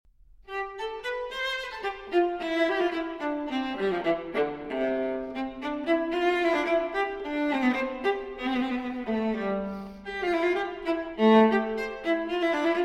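Cello Suite No. 3 in C Major Transcription For Viola Allemande; J.S. Bach; BWV 1009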